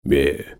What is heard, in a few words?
attack; Armordillo